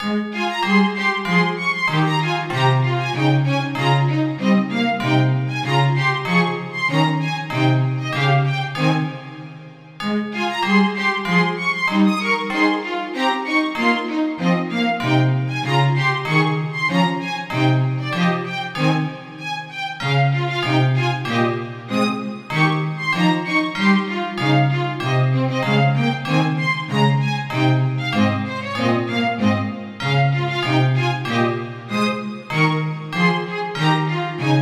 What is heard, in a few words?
strings